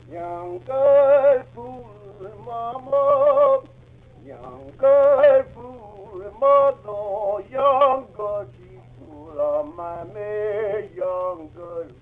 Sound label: sing; Mafie 1